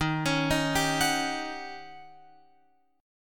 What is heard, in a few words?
D sharp 7th Sharp 9th D sharp Guitar Open F; D#7#9; arpeggiated; chord shape